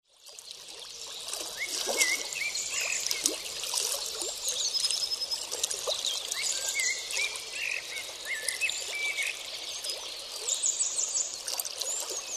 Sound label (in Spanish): el rio